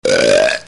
BURP

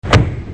Car Door Shut; Machines

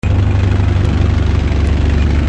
Tank, Machines

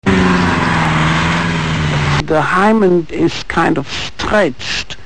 Hot Rod, Machines

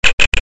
Communicator, Tools

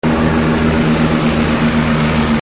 Helicopter Over Head; Machines

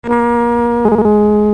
Electric Sound

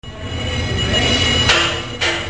Bodyshop, Machines

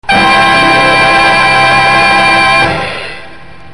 FanFare

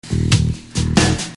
tune; Music

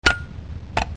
Light Switch

